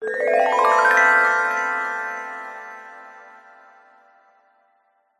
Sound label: magic glissando ascending bright warm twinkle; fantasy; zapsplat